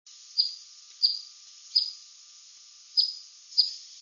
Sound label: pewee chip, woodpewee; birdsongs